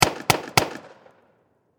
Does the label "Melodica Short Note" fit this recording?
No